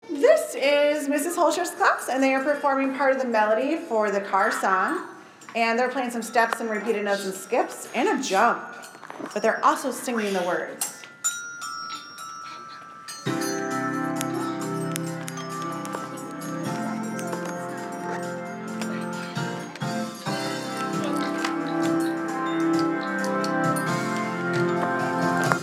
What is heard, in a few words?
car song